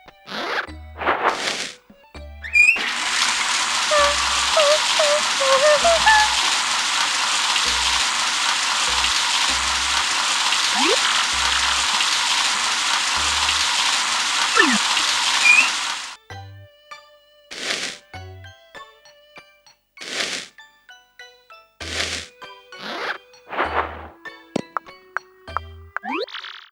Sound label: Peach Takes A Shower; paper mario 2 the thousand year door